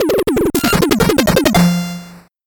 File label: 8bit jingle fast